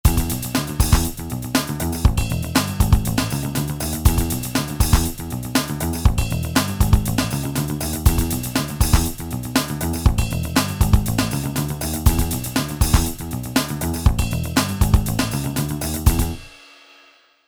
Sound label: Funk